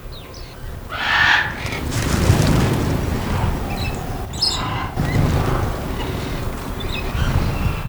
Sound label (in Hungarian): verebcsapat felrebbenese szelvedo, hazi vereb; állathangok; nyíregyházi zoo 2010